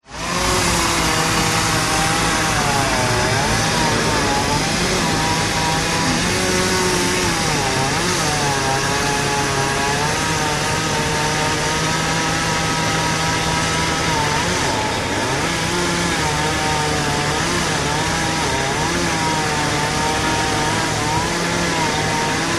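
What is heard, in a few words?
Chainsaw Background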